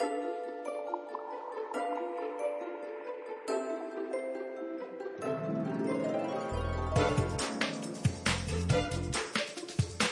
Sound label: cheerful; bgm